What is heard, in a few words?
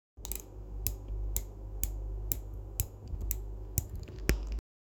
tea sip sip sound ambien; everyday life